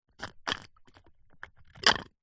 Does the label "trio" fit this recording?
No